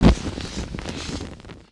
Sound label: burn